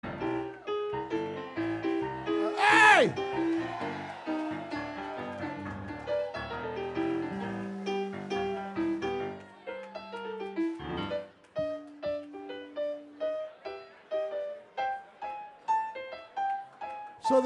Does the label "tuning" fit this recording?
Yes